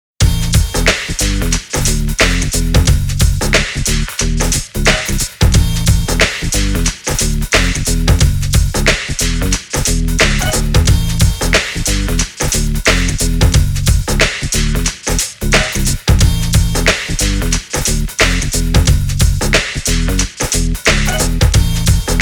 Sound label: Tension